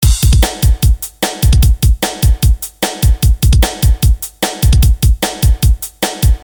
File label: 150 drum loop